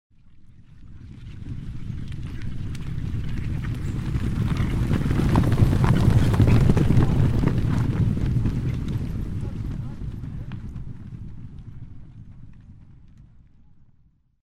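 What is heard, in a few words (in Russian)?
проскок 150-ти лошадей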